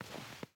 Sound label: snow step; teardown ps5 windows xbox series xs gamerip 2022